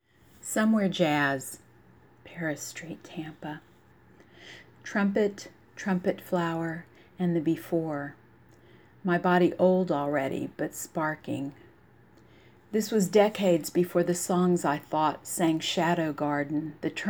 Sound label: jazz